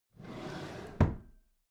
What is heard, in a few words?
packed drawer smooth clatter close regular